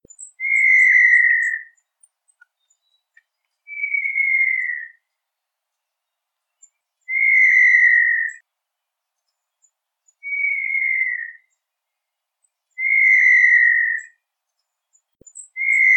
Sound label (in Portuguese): Canto da codorniz de montezuma